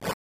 Item Chime; Food

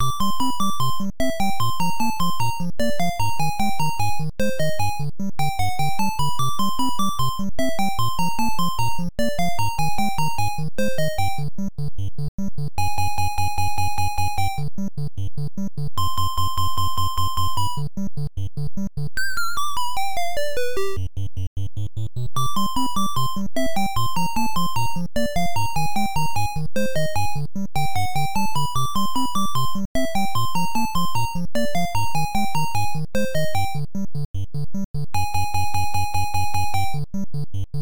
Cave BGM; Dragon Buster; NES; Limestone Cave

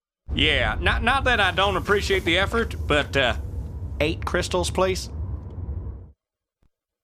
Miner reminder (cinematic)

